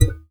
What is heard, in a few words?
Classical Guitar Extended Technique